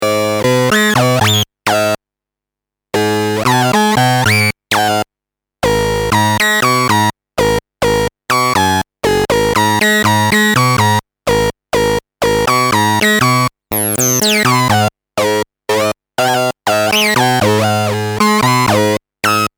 sync ADSR manuell; system700

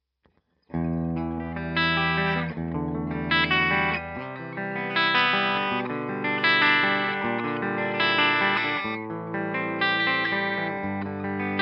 Steg PU Clean

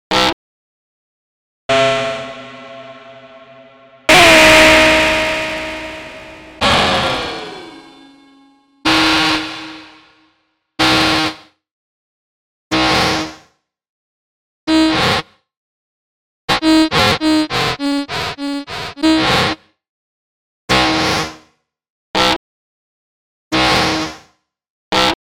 Synplant 2 Altsax verschiedene Iterationen; Soniccharge

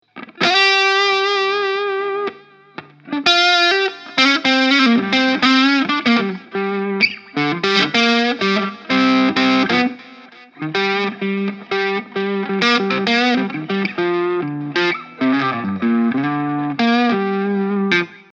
Ibanez AS93FM Hals zerre

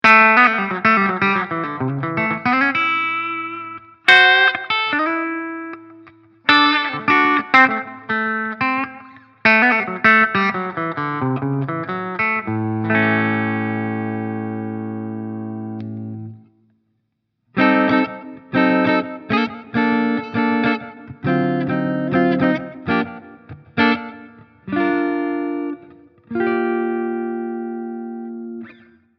Clean Steg Hals

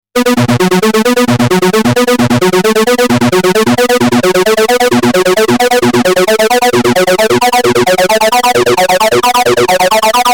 Semi Modular Sync mit Attenuator gesteuert

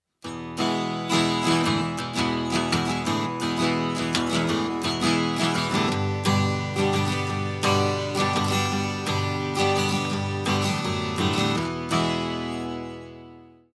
Guit, Preamp, Input voll übersteuert

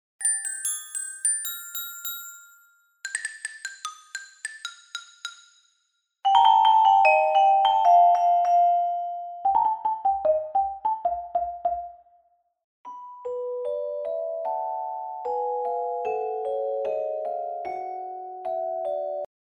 Schlaginstrumente

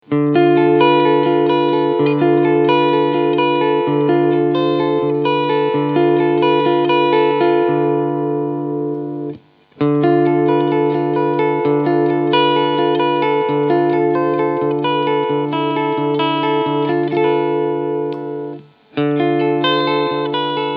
Dean MAB4 Cleansound Positionen 1 5; Michael Batio

